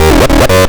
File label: Acid Lead; free techno acid samples; Acid house